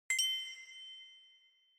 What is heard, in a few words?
Inspiration High Reverb; Onoma